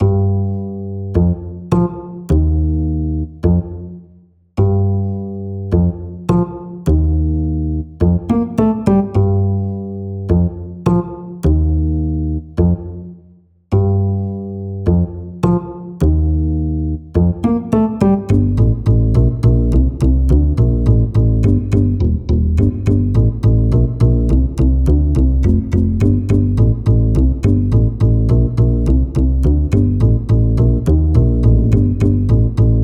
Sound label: Bass; U G M Smog2